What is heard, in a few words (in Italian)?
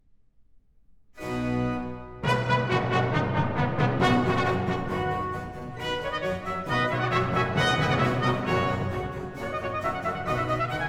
Aria, cantatas; Bach; BWV 172; DGG; Erschallet, ihr Lieder